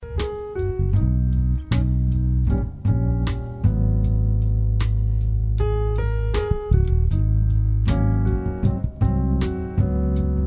jazz

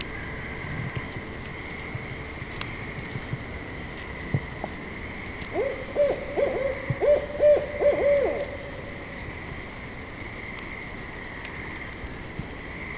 barred owl; tim spahr

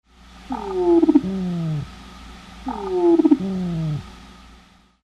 Treehopper male female calls

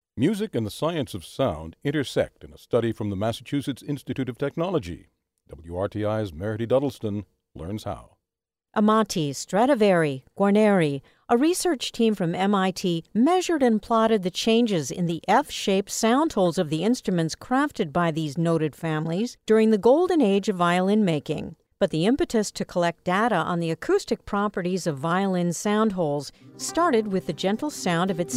Violin; Soundhole

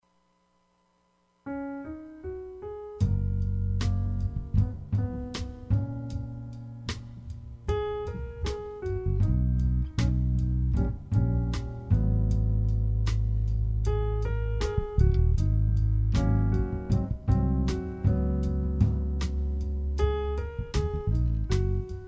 jazz

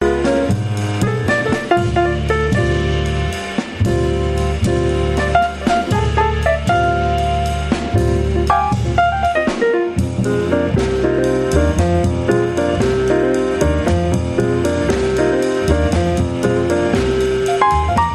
jazz

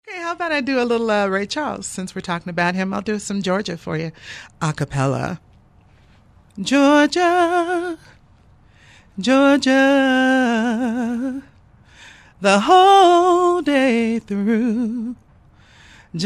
Georgia A Capella

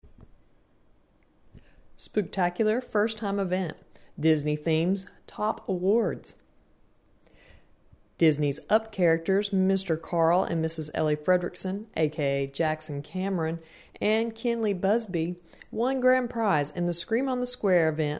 scream; spooktacular event

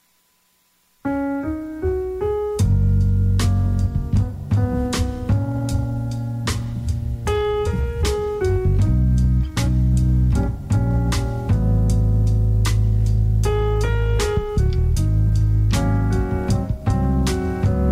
jazz

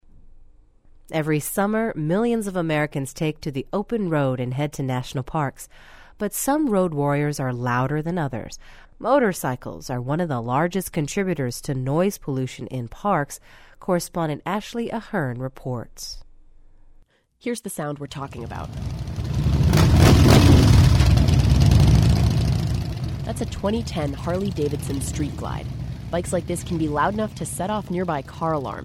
motorcycle noise

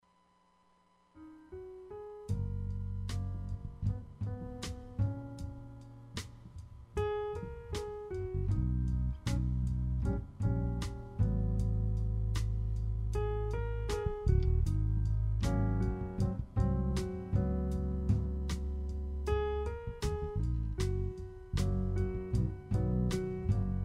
jazz

